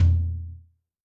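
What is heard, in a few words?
Lite Floor, Tom, Yamaha DTXpress-II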